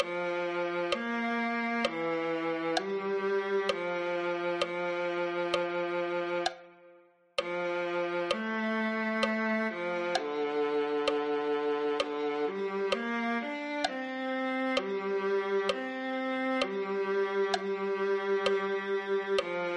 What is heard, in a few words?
viola